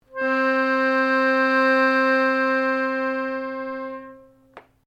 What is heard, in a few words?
PIANO ACCORDIAN C4 LONG, Old Piano accordian; Pianos (Sound Effects:Musical)